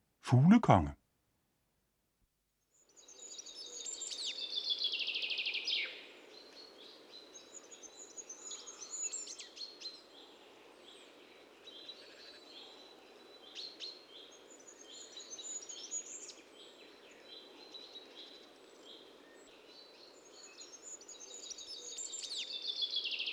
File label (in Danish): Fuglkong; assistens